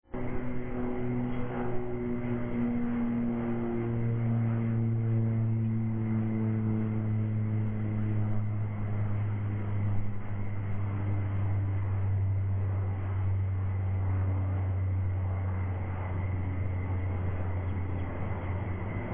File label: Turbo Prop Aircraft